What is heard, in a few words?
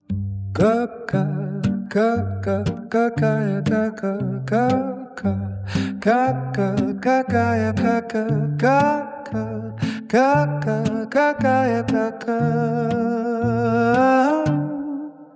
acoustic